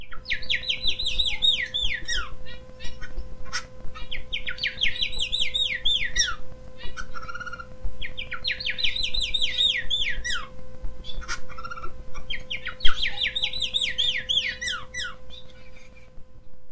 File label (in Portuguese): Canto do Bavete